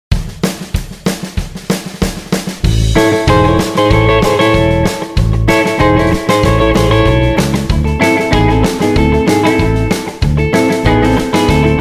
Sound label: Road Movie Rhythm, guitar